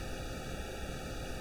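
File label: Farfisa Clarinet Noise, organ; Roland LCDP09 Keys of the 60s and 70s 1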